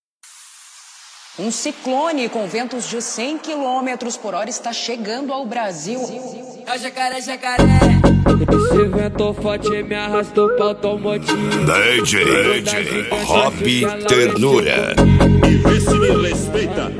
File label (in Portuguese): mega funk pipoco; svento forte